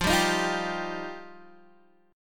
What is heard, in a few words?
F 7th Suspended 2nd F Guitar DADGAD; F7sus2; strummed; x,8,5,8,8,5